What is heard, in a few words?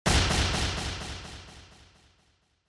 Motion Slam Delay